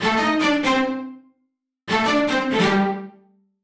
Stacc Str 128 A, uk garage, Synths; 128bpm Lines n Loops

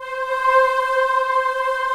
Choir; B CHOIR

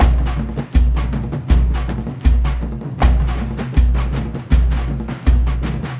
AF TRANCE; Spectrasonics; Heart of Africa 2

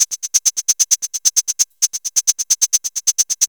kit 132; AMG - Vince Clarke Lucky Bastard

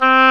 oboe, Orchestral Winds; Roland LCDP04 Orchestral Winds; Oboe 4-8; Oboe f 5

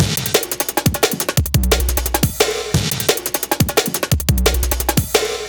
175, classic dnb breaks

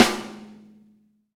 XV Snare, Roland XV-5080

